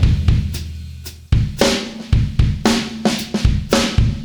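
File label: 113 bpm, beat loops; Zero-G; Monster Beats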